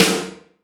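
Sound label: wet snare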